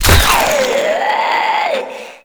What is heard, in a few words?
Inked Humanoid Death; Enemies; Warframe; Shadowgrapher